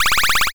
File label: fast forward